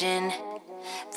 vocal

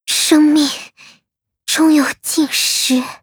fail sound; osu

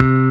bass

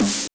snare; osu

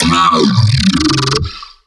Growl

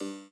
chord g

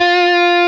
Left-hand vibrato

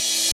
drum hit finish

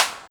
CLAP; osu

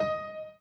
Piano Chord

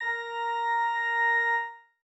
organ

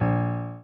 A#2 Bb2, Piano

